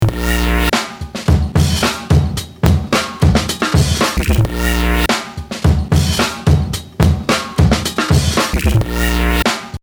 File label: beat w synth